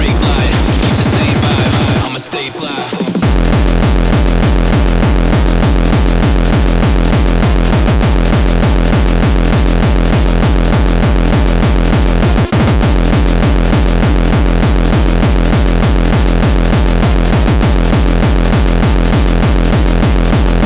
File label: bass